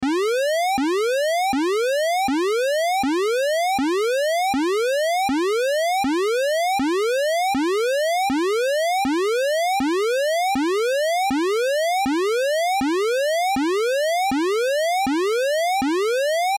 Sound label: Sci Fi Alarm Battle Stations; Loop; Control Panel; Blastwave